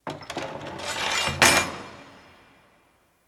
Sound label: door open; Golden Crayon Sounds